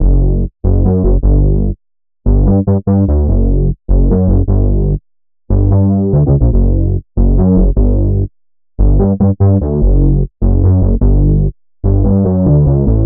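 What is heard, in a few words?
bass F minor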